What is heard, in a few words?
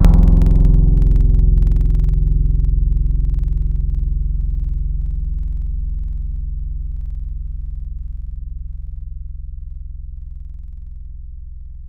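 Dobro Low, Guitar; Synthetic's Serum Collection; Echo Sound Works Sphere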